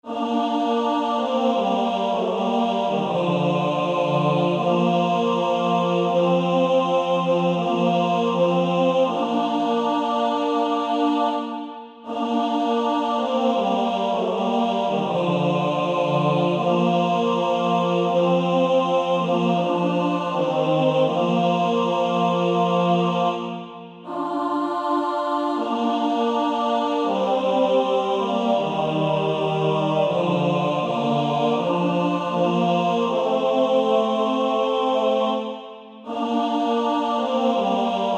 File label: Male Choir TB; Pass me Not O gentle Savior